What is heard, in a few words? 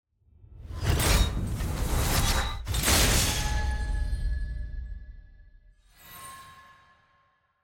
level up; champion mastery